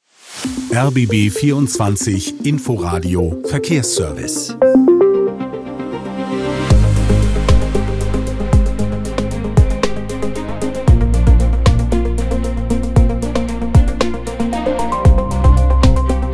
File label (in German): Verkehr